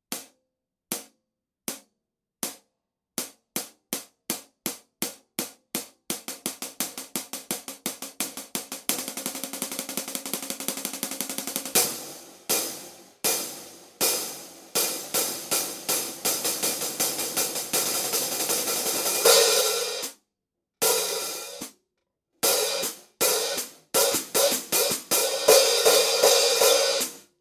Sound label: Jazz Hats thin, hi hat cymbals